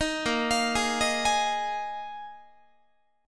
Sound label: Bb 7th Suspended 4th Bb Guitar 4A,4E,5A,5D,5G sharp,6C sharp; arpeggiated